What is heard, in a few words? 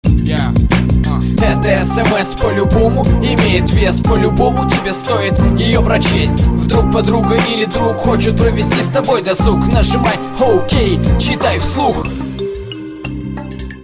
russian sms rap ringtone